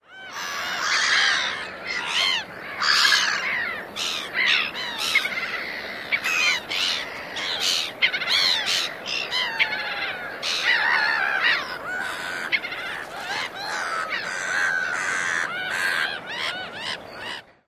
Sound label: small colony